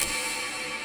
clock

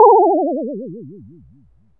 RESULT CLASS DOWN; maimai

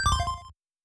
Notification; 主播女孩重度依赖

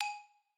xylo

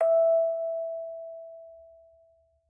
orgel

